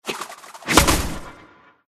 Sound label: attack scrap